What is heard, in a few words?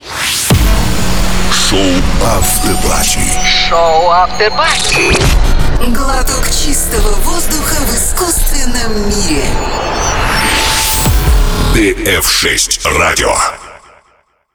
Jingle